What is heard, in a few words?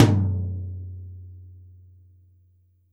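Jazz kit tom, Drums Acoustic; USB Soundscan vol.10; AKAI; JAZZKIT 2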